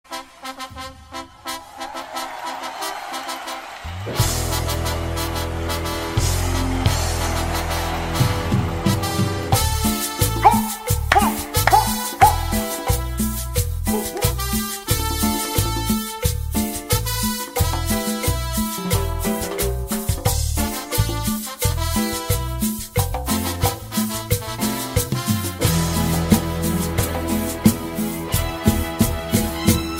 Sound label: Cumbia